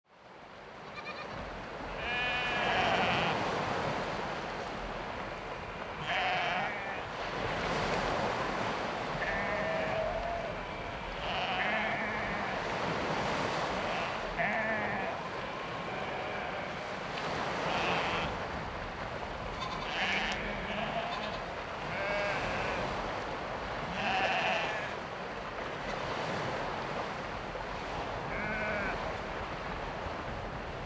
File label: provata; 2ου Όρμου; Μονοπάτι 2ου Όρμου, σημείο θέας ΒΑ Χειμώνας (ΗΧ); 2os ormos provata